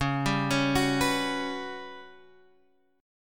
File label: G Major 7th Flat 5th C sharp